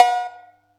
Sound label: Yamaha EX-5